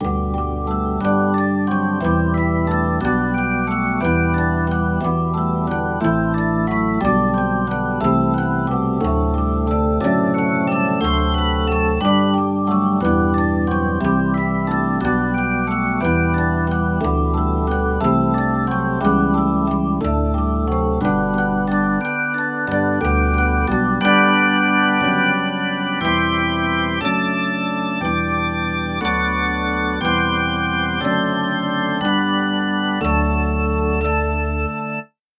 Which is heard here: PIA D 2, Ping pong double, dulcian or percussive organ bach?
percussive organ bach